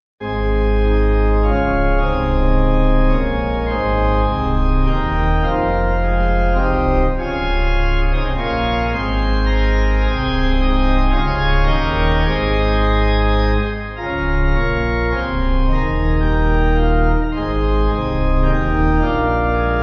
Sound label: Pipe